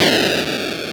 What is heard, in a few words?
sound enemy dead; arcade-canvas; defender